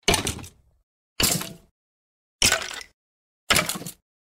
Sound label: hurt